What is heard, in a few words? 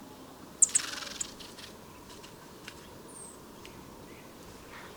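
cirl bunting cirlus juvenile flight call; kaiserstuhl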